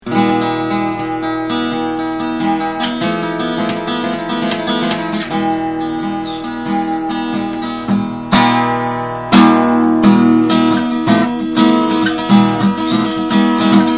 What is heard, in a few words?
spanish guitar riff